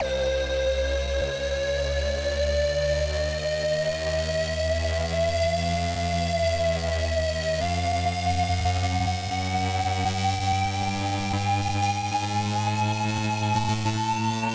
Club Music Risers Alien

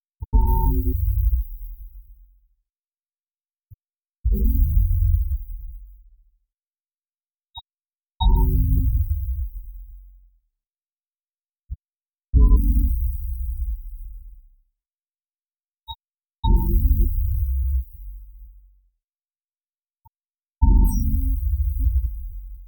Perodic High Ultra, Electro

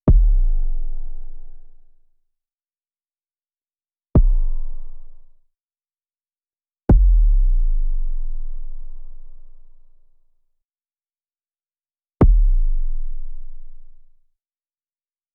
Impact Thud Nock Periodic

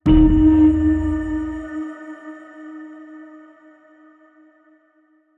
Tension Builder Impact

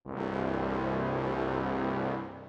Dramatic Brass

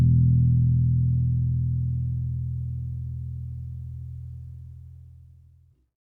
Drone; Drones

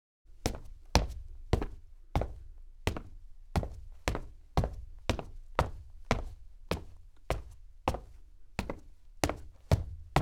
Wood Hard Sneakers And Stairs, Footsteps

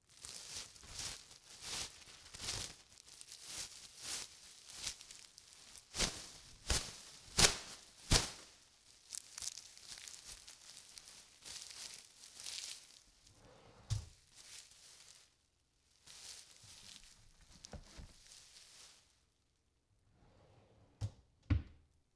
Trash Bag; Cooking

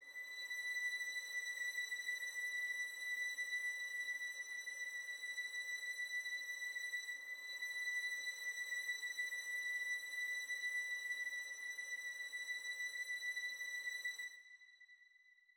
Tension Builder

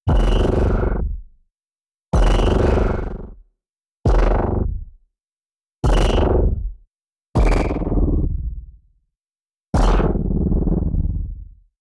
Impact High Ultra Rise

